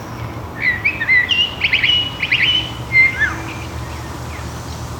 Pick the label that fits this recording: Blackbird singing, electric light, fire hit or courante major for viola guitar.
Blackbird singing